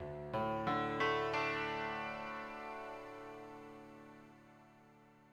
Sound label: ethno, 90, D